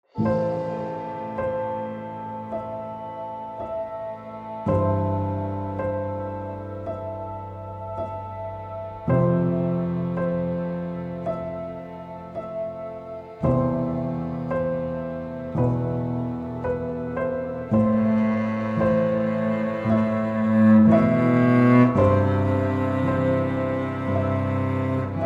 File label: piano